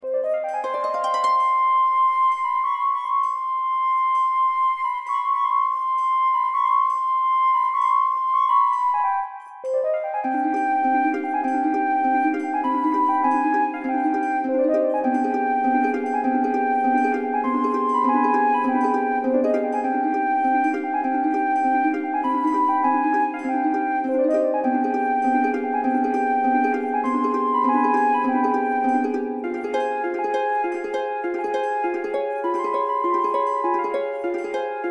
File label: ghost; Manamon Music; Manamon 2; dyaz